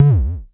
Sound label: Klone Dual Percussion Synthesiser tom